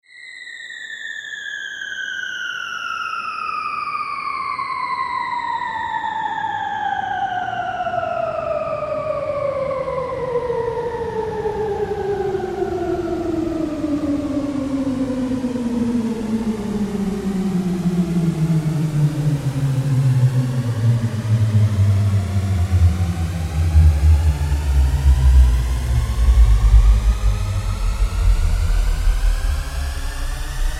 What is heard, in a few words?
Sci-Fi SFX